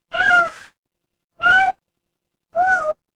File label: animal cat meow